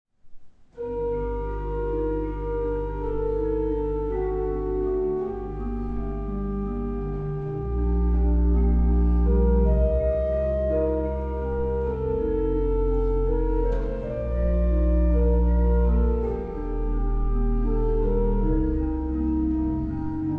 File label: trio, orgeln; schiessen; rheinberger